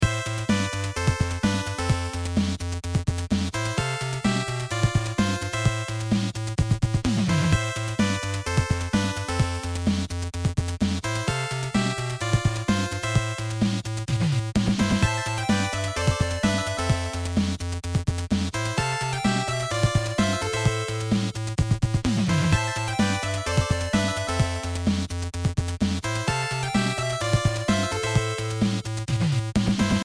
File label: beat